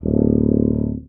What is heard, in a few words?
Contrabass Tuba Straight D0; DirectWave; Varazdin Orchestral